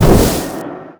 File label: attack; dota; obsidian destroyer